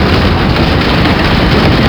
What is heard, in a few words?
roll metal rough; terrains; Tony Hawk's Project 8; XBOX